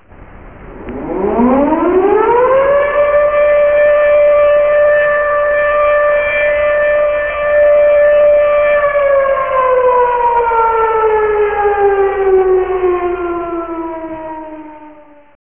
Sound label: Air Raid; Triple-A 1.6